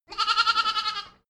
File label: goat lamb baa close